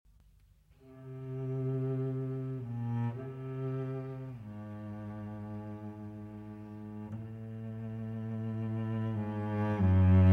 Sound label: Cello Concerto in E-Flat Major, Cadenza; No. 1; Op. 107